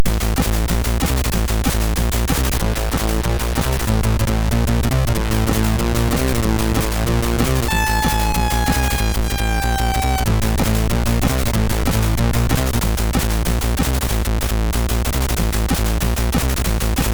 Funk Punk, sid MOS6581R3; hvsc

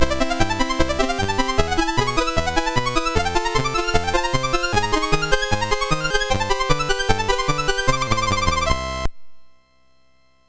Juke Box, MOS 6581 R4

